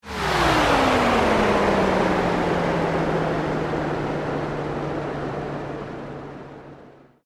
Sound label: Sweep Mechanical Slow